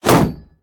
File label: shield block